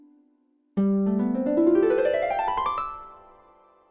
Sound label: harper tune; dragon riders chronicles of pern 2001 dreamcast gamerip